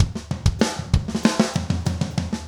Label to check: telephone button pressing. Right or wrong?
Wrong